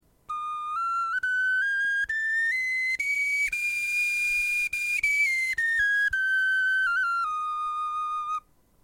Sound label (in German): tonleiter